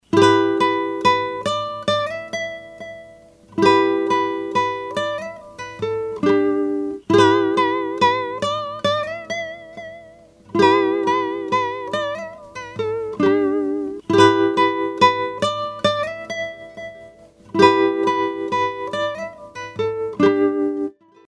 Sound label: vibrato tremolo; orinj